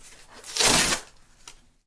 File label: tearing cardboard